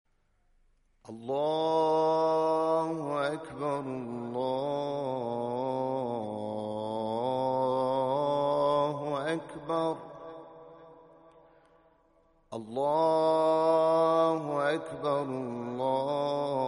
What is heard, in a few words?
azan